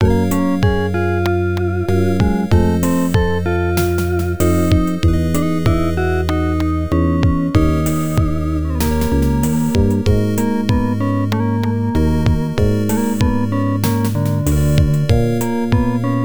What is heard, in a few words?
Event Clear Completed; mahou shoujo fancy coco; pc-98